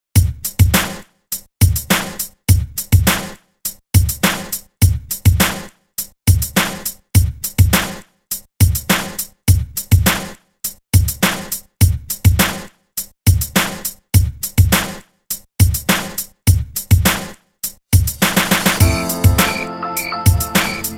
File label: bpm 103